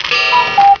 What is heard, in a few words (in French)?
coucou